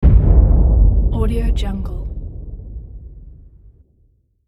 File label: implosion explosion